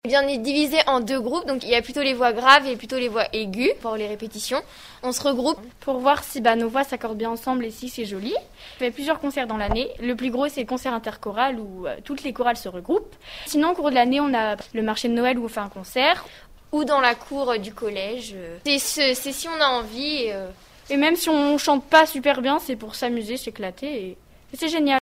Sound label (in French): chorale